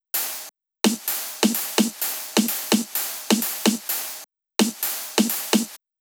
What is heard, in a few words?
Top Loop, Drum Loop 128BPM; VEC5 Drumloops; VEC5 Multi Loops; Loop Elements